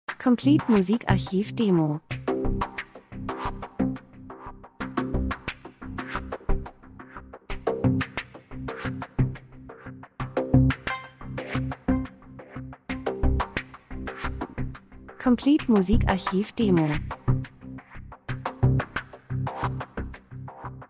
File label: drums; Elektro Rhythmus Spannung Monoton Produktion Interessant Urban Zeitraffer Nacht Kalt Aufmerksamkeit Spielfilm TV